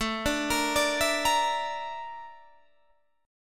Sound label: Bb Major 7th Flat 5th A